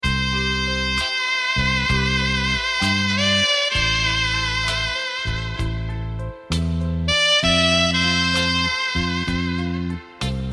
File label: romantic saxophone